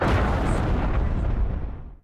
boom; BALLY; TWILIGHT ZONE; Pinsound

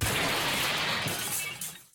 crash; BALLY; TWILIGHT ZONE; Pinsound

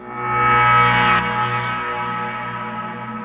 twiing; BALLY

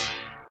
warning beep; sfx

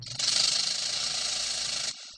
rattle snake; sfx; WILLIAMS; INDIANA JONES; Indiana-Jones-Original

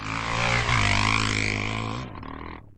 motocycle; sfx

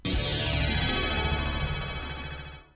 spiral breakthru; BALLY; sfx; TWILIGHT ZONE; Pinsound; Twilight-Zone-Full-Reorchestration

